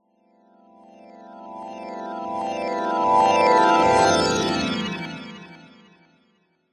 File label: fantasy magic glissando reversed bright twinkling